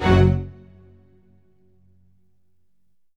ORCH HIT F#; Q Up Arts; Denny Jaeger Private Collection

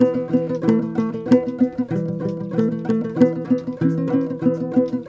TOPSHUR; Soundscan vol.60; Asian Traditions; AKAI

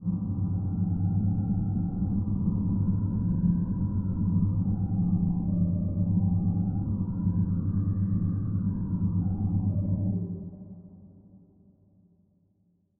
STRESS

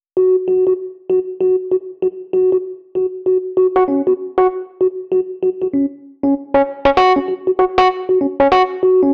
Rhodes, Cinematic Funk; Sonic Foundry (Sony Creative Software); Cinematic Funk Urban Tone Poems

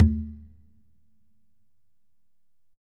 Congas wet, drums percussion

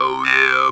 TB OH YEA, Talk Box

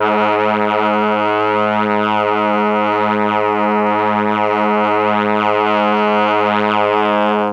MEL BRASS; ILIO; Propeller Island; Legendary M400

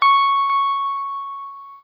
NOODLE LONG, Guitar & Bass

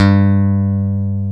G-1 PICK, BASS; AKAI S-Series CD-ROM Sound Library VOL-8; SET#1 BASS

